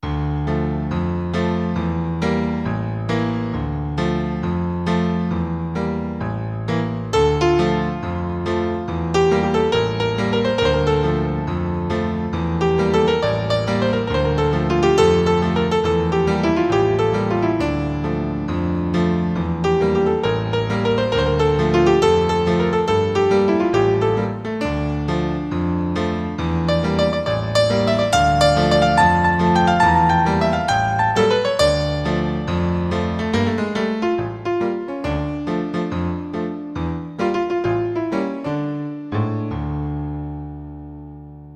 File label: am blues